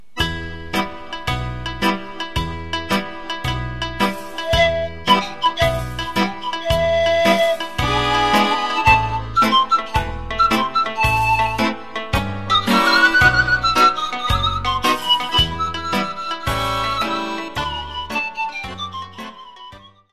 Old Romanian Song

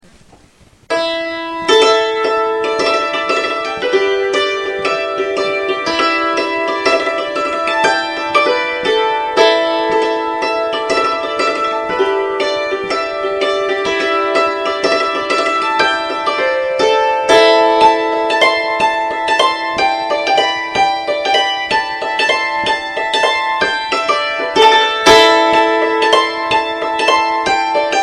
hammered dulcimer; instruments

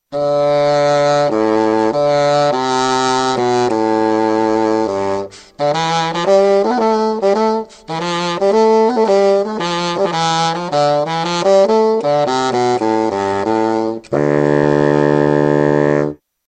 curtal; instruments

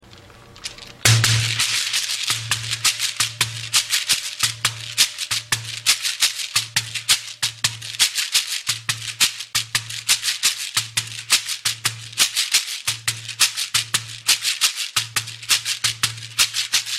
xequere

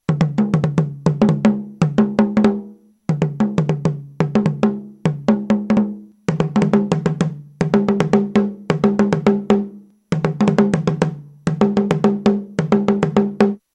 atumpan; instruments

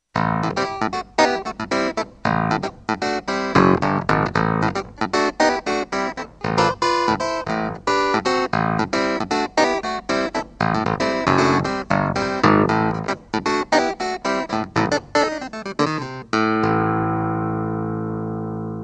clavinet; instruments

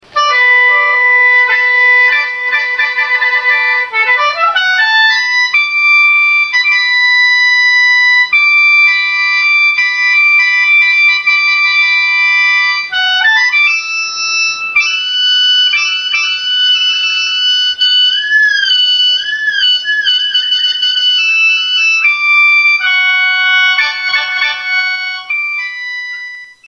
cheng; instruments

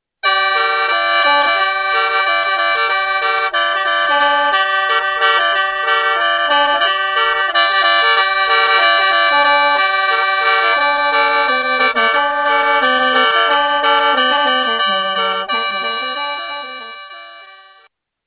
khene; instruments

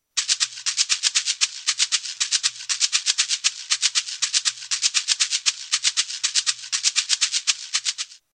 sekere

